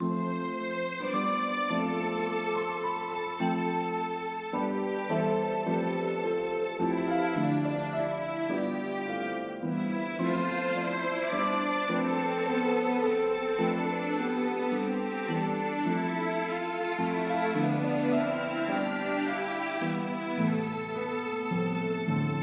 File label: peaceful living room; pirate mysteries android ios macos mobile windows gamerip 2012